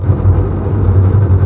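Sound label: TREMOLO STRINGS G1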